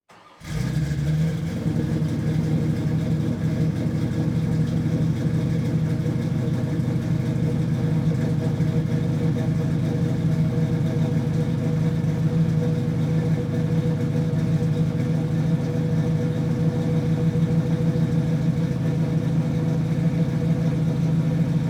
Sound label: Car 31 Ford High Boy Start Idle Revs Off Mic In Rear; transportation